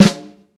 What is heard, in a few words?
warm sounding snare drum sound g sharp key, snare single hit tuned to the G